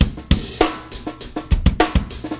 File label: nice n slow, drum loops and breaks, 100 bpm; 091 to 100 bpm